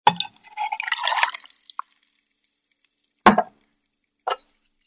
Pour the liquor into a shaker; alcoholic drink

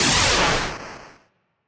thunder magic middle; 魔法/法术的音效

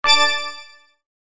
break and chain

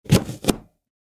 Cut a lemon

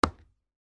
footsteps wooden floor; 足音

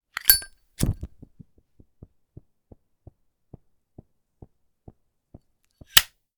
Oil lighter Sound

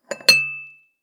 Put down the coffee cup

